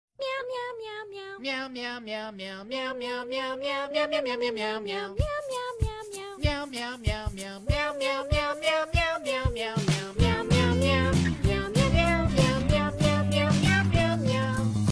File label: meow mix, cat food